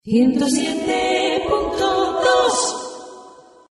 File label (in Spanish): 1072, Dial 107.2 f.m.; diales cantados; cantantes para anuncios; Cantantes de publicidad